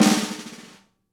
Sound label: Pop Snare Ghost; Snares